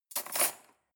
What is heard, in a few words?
cutlery cake forks drawer place